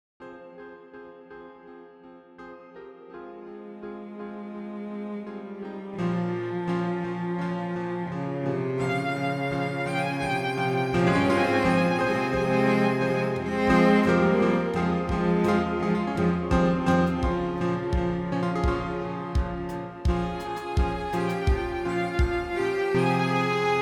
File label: Dark Church